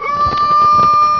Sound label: chicken attack; svencoop; hunger